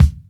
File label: clear kick single hit d key